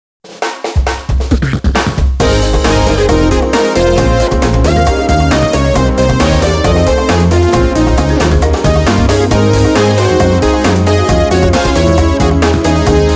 Music Success event

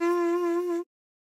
Pungi Note; tintin reporter cigars of the pharaoh

